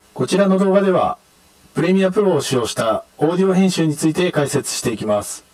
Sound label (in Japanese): ギタースイートドラムスイートトラック